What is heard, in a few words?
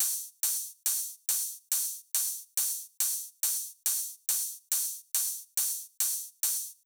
drum Loop 140BPM Ride; Vengeance - Essential Clubsounds Vol.5; VEC5 Drumloops; VEC5 Multi Loops 140BPM